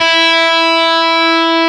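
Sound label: arpeggio; osu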